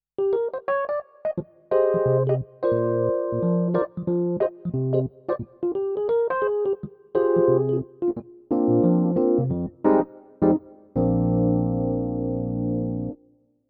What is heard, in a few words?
NU1 EPiano